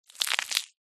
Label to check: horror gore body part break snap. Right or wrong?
Right